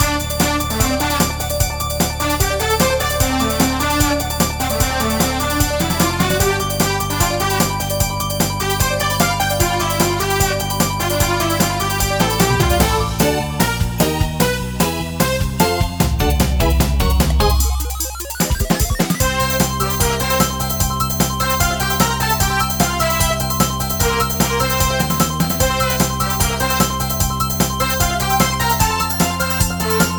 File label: Loop